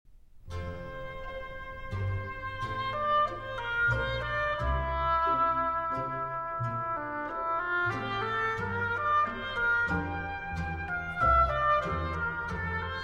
Concerto For Oboe and Violin in C Minor; Albrecht Mayer; Bach, JS; BWV 1060R

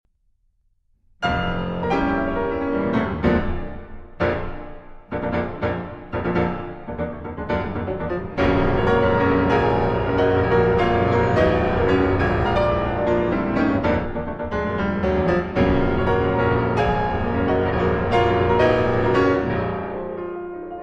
Piano Sonata No 2 Allegro Molto

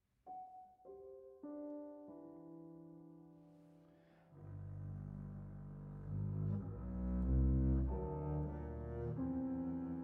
Double Bass and Piano

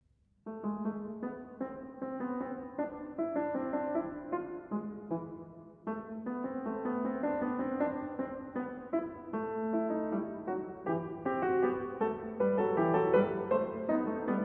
Prelude & Fugue in A Minor, BWV 865 II. Fugue

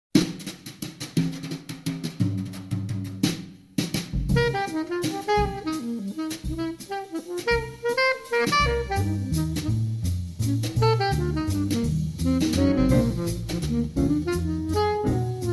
Waltz Blues

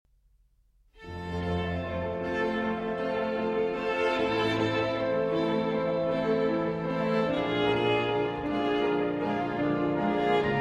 Piano Quintet, For Ensemble; Piano Quintet "Annunciation" Pt. 1; Arr. For Ensemble By Angèle Dubeau and François Vallières

